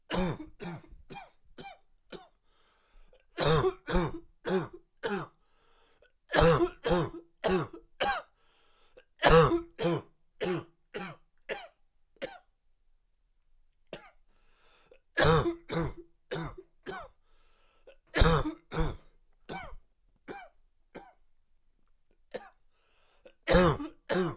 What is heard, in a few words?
Man Coughing